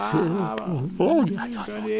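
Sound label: Music Village Calm Inside; from dust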